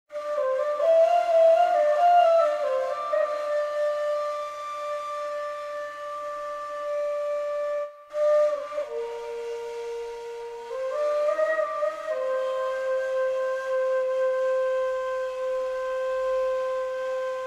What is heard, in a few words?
Otherworldly Flute; Age of Conan Secrets of the Dragon's Spine